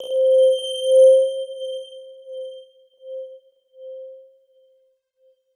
C4, Synth Hits; Zero-G; Soundclash Nu Dance Electro; Acidized

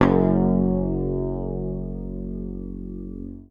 F#1, Bass Library, PICK; Sampleheads; Will Lee Bass Library

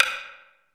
ECHO PERCS, effected drum; Crypton - DrumBase5000

